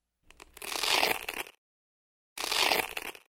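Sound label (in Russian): velcro fasteners torn open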